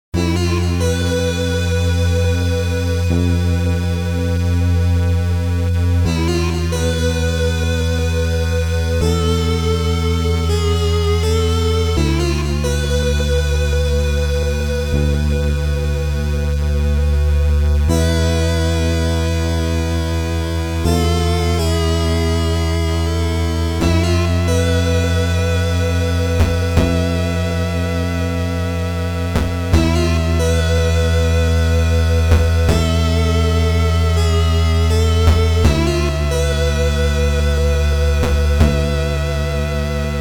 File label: Mellow Victory; Xak II; Fray